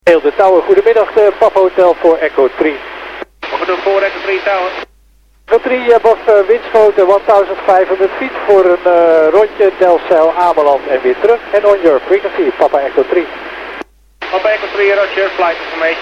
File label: overhead; winschoten to delfzijl therafter ameland